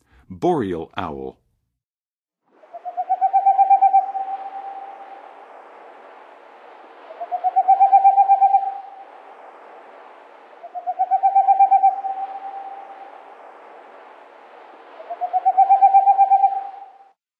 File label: Boreal Owl, Birds of North America; Western USA; Western USA Songbirds